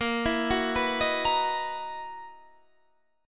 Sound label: F sharp 13th A sharp